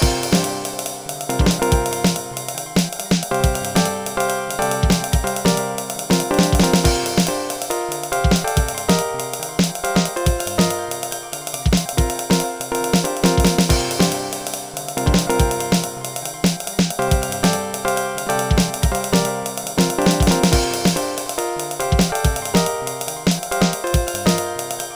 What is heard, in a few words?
solo; Dr. T's MIDI Recording Studio